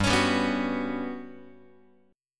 G Major Sharp 11th G down Guitar 4A 4E 5A 5D 5G sharp 6C sharp; Listen to GM#11 strummed; GM#11 Chord